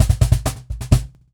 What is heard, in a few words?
Cajon Fill; musicradar